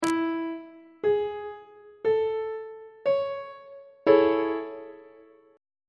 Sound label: g double sharp major 7th 2nd inversion on treble clef